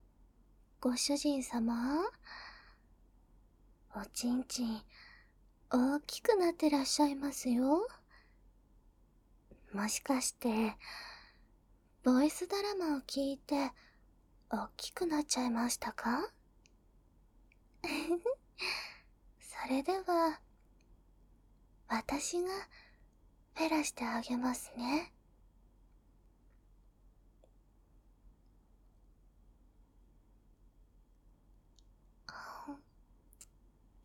メイド設定ご奉仕フェラ カウント ステレオ

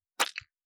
射精音付属音

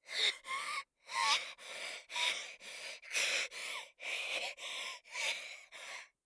威嚇; 効果音あり

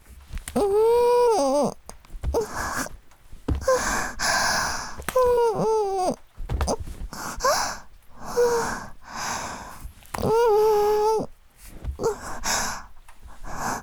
田舎の古宿ン娘の夜伽 宮崎弁 母の喘ぎ声無しバージョン 雨降りバージョン ギシアンループ

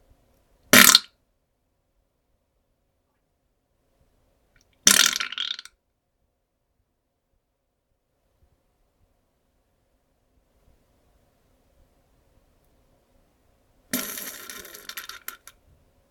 ぶぶっ ぶぶぅ ぶふーぶぶ ぶ ぅぅ, ふぇち音 下痢の音

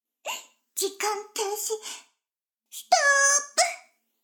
シコシコ音声; 逆時間停止されて

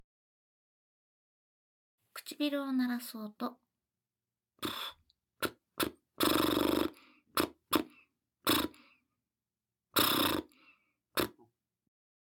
唇をブルルルする音

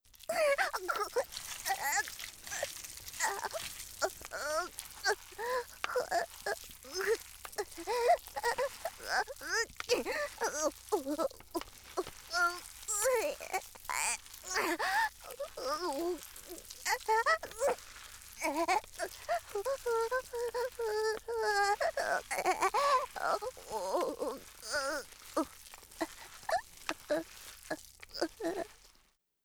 触手

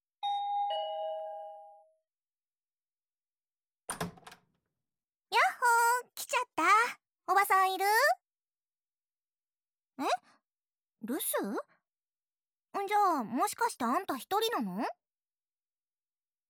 耳ふーふー; オープニング～初めての勃起～

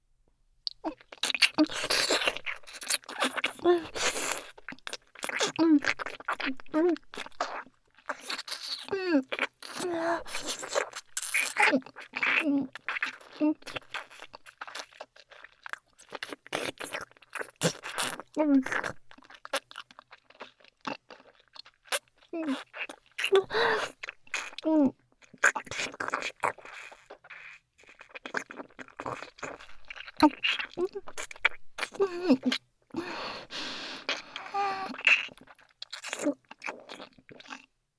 唾液を交換するキス

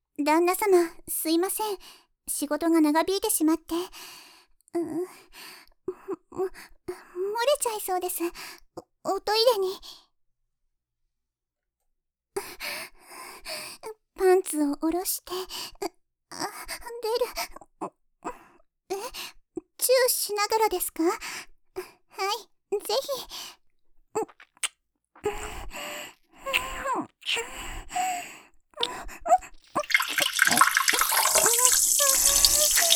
おしっこ; 3人のお屋敷メイドの週末おま○こ奉仕

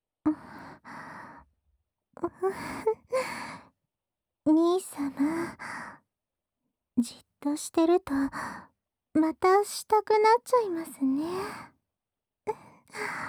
ゆったり内風呂で身体洗い; お耳を舐めてお手々で…

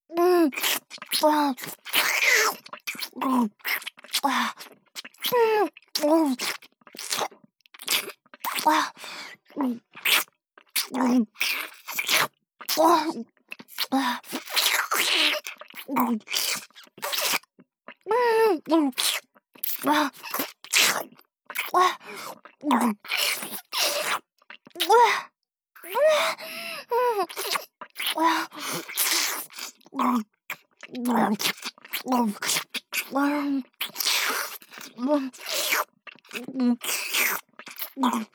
ノーマルフェラチオ（激しいVer）【ループ】

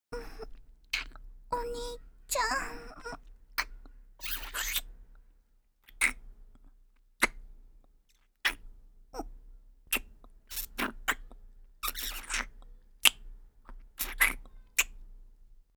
キス (ノーマルキス)

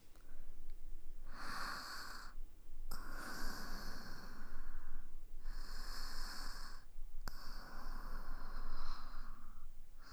寝息 ループ; 灯火の館～狐さんと一緒～

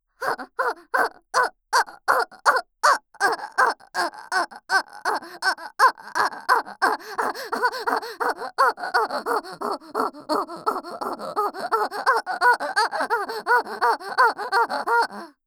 おっ！♥おっ！♥おっ！♥おっ！♥

